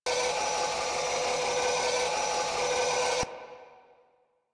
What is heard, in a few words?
Turret Tether Idle; Warframe; Zariman